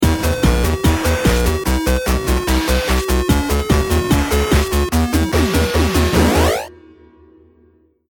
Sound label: round mvp anthem